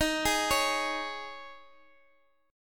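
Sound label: E flat 7th Suspended 4th, Guitar, DADGAD; x,x,x,8,11,11; down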